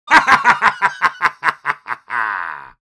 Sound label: Old man sinister evil laugh